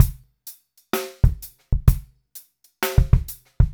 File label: 064 Pop Ballad Drums; Pop Rock; Slow Tempo; Digidesign; Pro Tools; Pro Tools Essential Loops